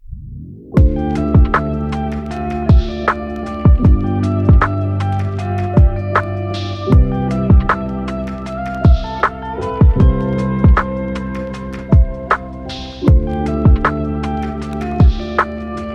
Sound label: Lofi